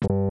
incorrect; lemmy wendy; smw

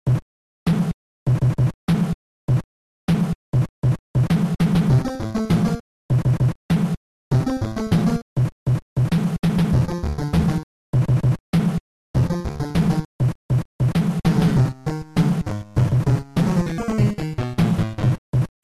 underground; smb2; proto

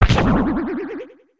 impact

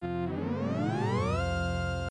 keyhole exit; smw

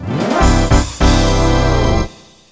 multiplayer start

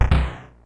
thud; smas-smb3

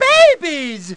babies; mario

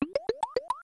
reserve item release; smw

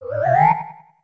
appear disappear; moneybags; sm64

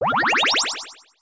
spinning heart

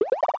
ground pound; smw2

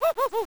barrel up

